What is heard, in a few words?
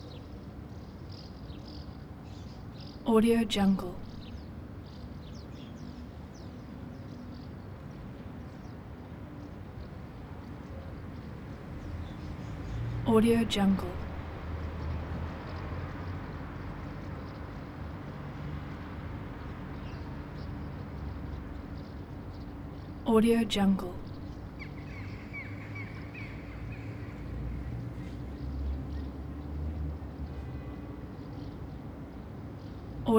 ambience smalltown morning birds light traffic; soundbits soundfx